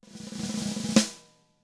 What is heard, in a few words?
ROLL, SNARE ROLLS; ILIO; Double Platinum Drums 2